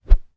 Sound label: medieval katana sword swing; still north media